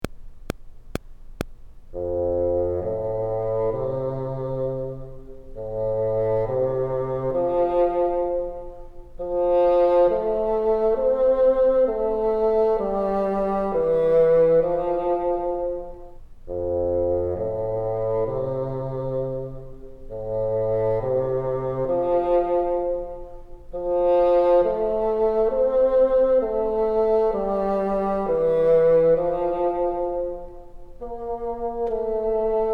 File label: top, bassoon